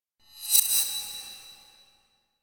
Whoosh Metallic Reversed Thin Attention Grabber - Very High; Hollywood Edge; sound designer's toolkit v2